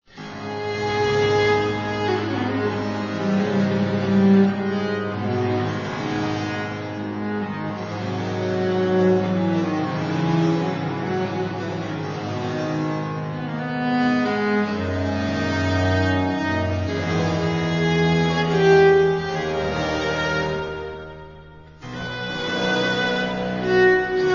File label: prelude suite A; Marais